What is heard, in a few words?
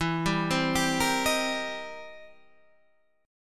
C Minor 7th E; arpeggiated